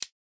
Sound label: button Hover; netent